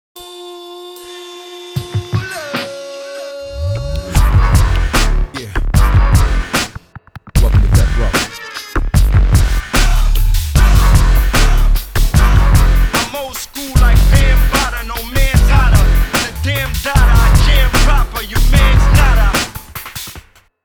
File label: Car